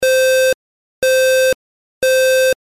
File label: Low Freq Temporal